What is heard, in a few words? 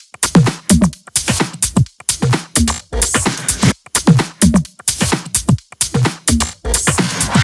129BPM Kit; Vengeance Samples; Micromax Kit